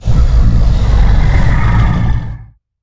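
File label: Stealth Attack Start; Evolve; Goliath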